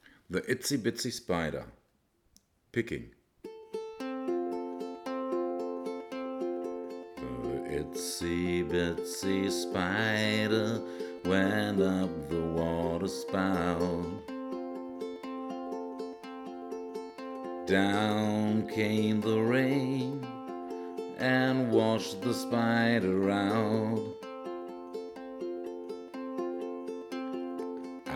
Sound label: Itsy Bitsy Spider picking; Traditional